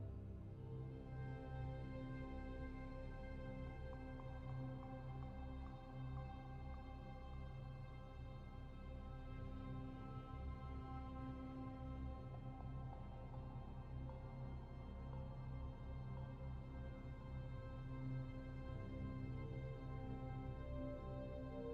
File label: Portal Room End Nopiano Loop; elroy and the aliens